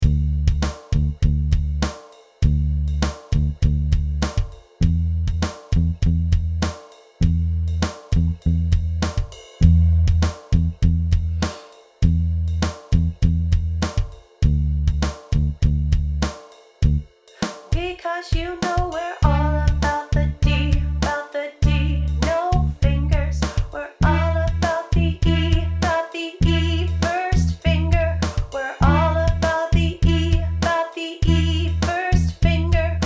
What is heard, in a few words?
with strings